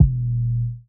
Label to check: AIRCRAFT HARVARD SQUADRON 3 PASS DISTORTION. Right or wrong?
Wrong